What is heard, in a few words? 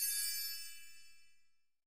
paytable button; netent; magicportals